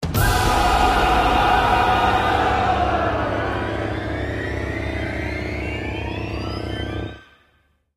Combat Heavy Intro; Darksiders

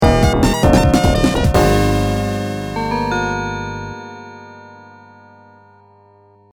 Level Victory; chirpy reverie online gamerip 2021

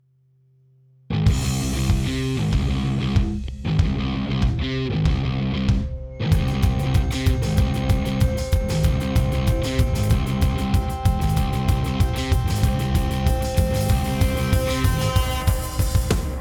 Intro 190bpm; battlefield hardline; ps3 ps4 windows xbox 360 xbox one

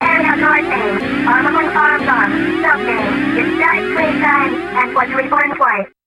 Warning

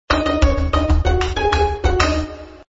Level Win Music

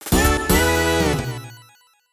Jingle Perfect Strike!!!; StreetPass Mii Plaza; Ultimate Angler

